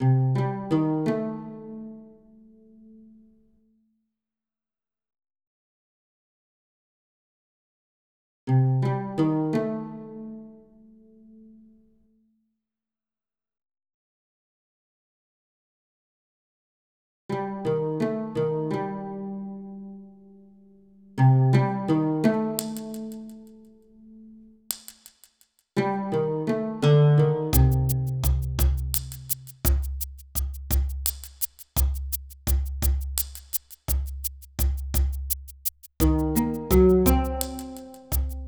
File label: doubt music